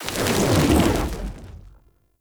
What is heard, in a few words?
Summon Fire; Warframe; Uriel; Demonium